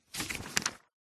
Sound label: book workbook page turn; household